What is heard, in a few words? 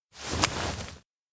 handshake; makoto; anemoi パッケージ版 1.1